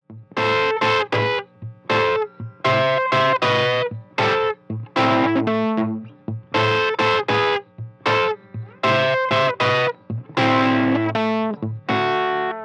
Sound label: strat victoria 518 delta blues